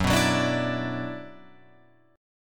F sharp 7th Suspended 4th Sharp 5th, Guitar, Open F; F#7sus4#5 strummed